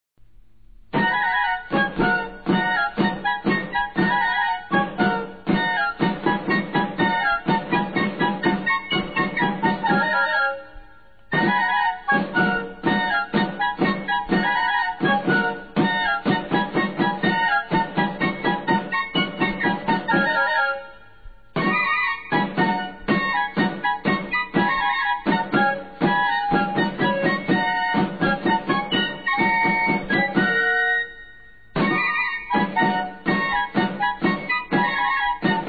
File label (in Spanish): Generala; ORDENANZAS CARLOS III; 1769; TOQUES DE CARLOS III